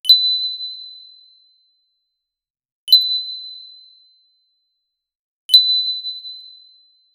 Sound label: clear robotic ping ping p, electronics